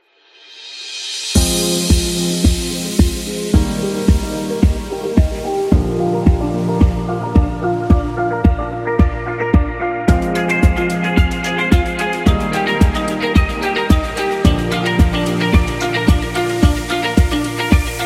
Fashion Commercial

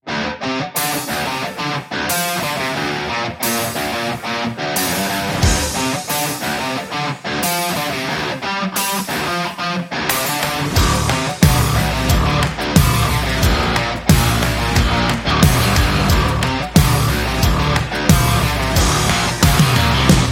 Hard Rock Music; active rock music

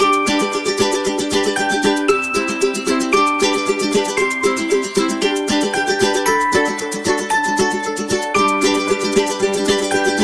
Food Commercial

